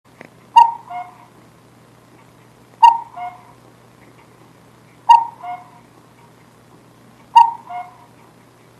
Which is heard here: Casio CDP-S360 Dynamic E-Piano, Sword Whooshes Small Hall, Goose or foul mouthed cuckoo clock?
foul mouthed cuckoo clock